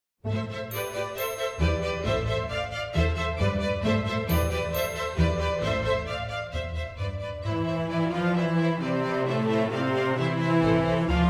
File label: Without Narration